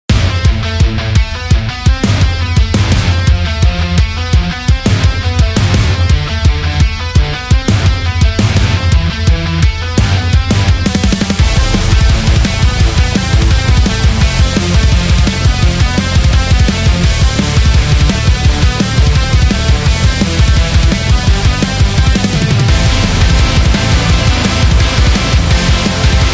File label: Heavy Metal, Heavy metal background music inspired by anime battles, speed, and pure adrenaline